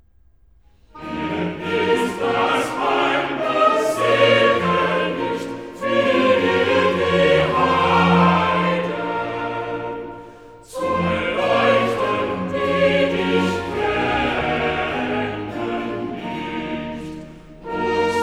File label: Chorale; BWV 125; Er ist das Heil und sel'ge Licht; Bach; Cantatas BWV 82, 83, 125 & 200; Gardiner